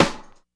warm sounding snare drum sound F sharp key, Royality free snare single hit